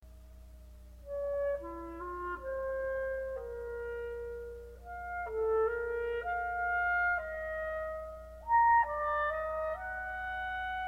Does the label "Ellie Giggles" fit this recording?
No